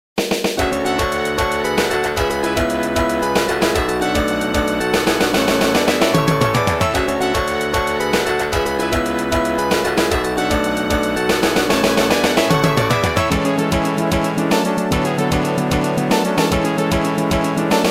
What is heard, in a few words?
Victory March; Konami Shooting Collection